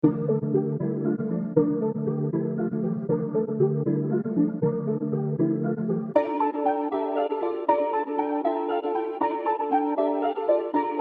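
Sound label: D minor 157 BPM Piano Keys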